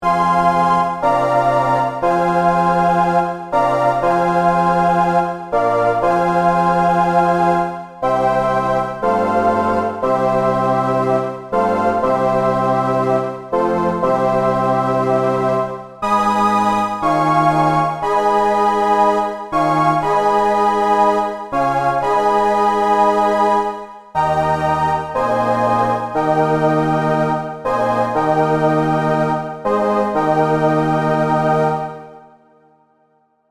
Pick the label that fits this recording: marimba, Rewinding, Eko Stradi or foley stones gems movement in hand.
Eko Stradi